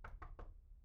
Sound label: knock; IEEE AASP